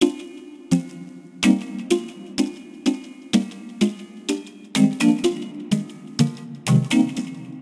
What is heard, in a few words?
Scootering C Minor 126 bpm; MIDI Clips; Tonal; Ableton; Factory Packs; Lost and Found